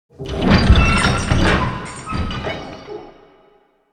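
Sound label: big chains sweetener close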